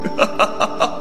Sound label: laughs